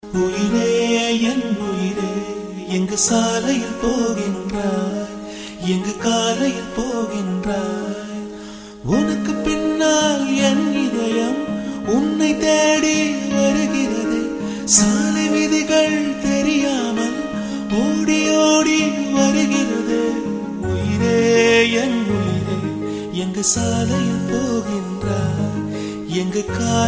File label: I Miss You - Tamil